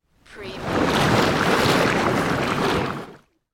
Water Movement Single; Sound Effects Bible

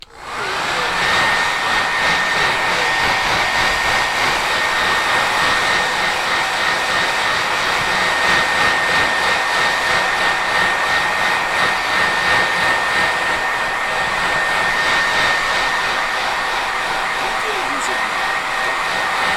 Hairdryer Moving

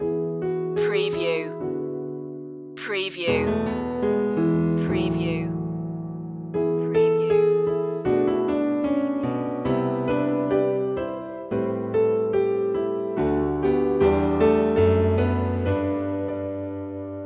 pastorale loop

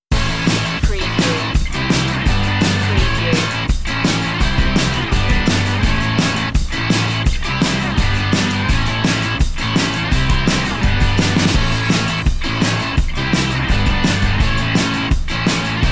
indie party

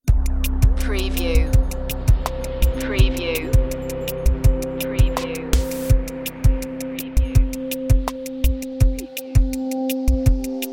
spectral waves no glitch fx

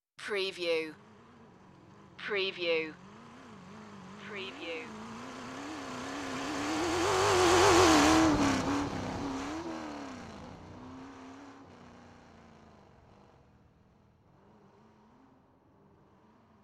Chevy V8 Hot Rod Drive By

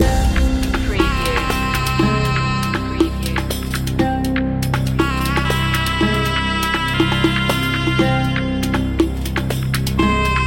eastern mystic loop

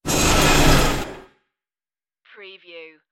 rusty starship door

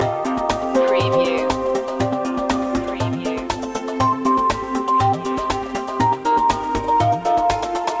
solar wind loop

